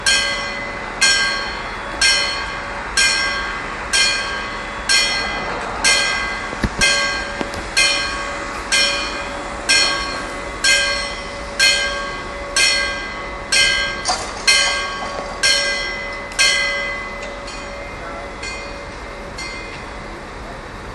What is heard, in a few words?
ding; Jernbaneoverskæring #3; Svendborg Station